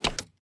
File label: piston out; minecraft; tile